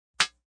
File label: Blip; Beeps; multimedia